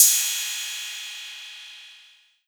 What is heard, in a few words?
Cymbal Thin Long TR808